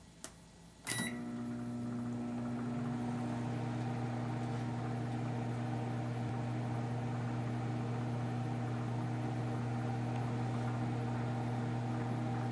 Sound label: popcorn